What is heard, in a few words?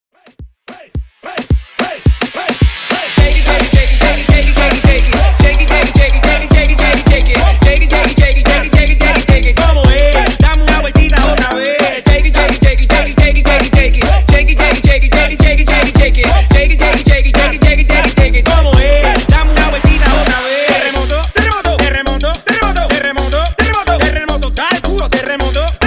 Hype Rework 108 Bpm